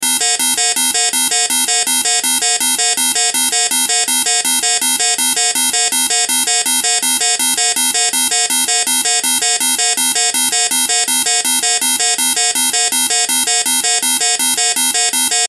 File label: Alarm Jet Eject Alarm Fighter Plane; Military; Warfare; Alarms